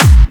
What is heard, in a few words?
Clubby Kicks, Bassdrums